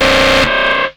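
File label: RUMBLE right, Hard & Loud Techno; USB Soundscan vol.01; AKAI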